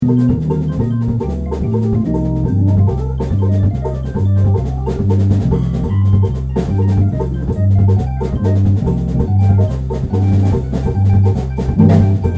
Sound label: Latin jazz